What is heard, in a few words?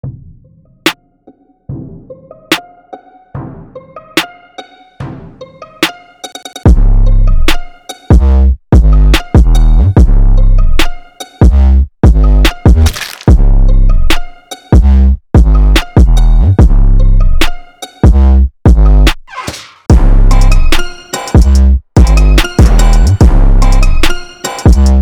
trap song